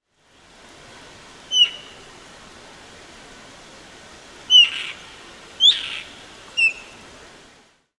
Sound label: Elanus Axillaris, bird species; Black-Shouldered Kite